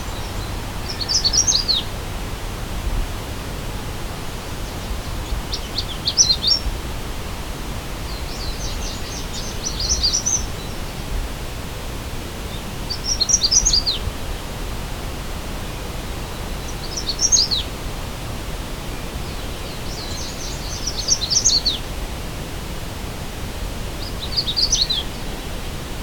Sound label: Dendroica magnolia; Magnolia Warbler; Michigan Bird Songs